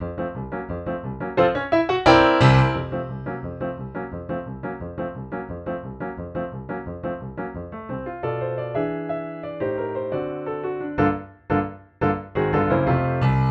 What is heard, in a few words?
accomp full half f major